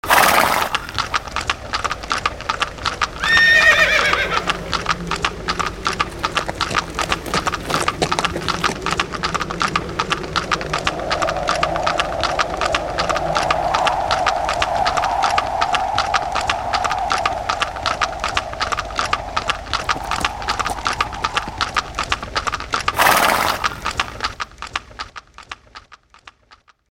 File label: Ringtone; HTC; Snow Flake; Sound Sets